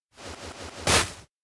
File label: lose balance; anemoi パッケージ版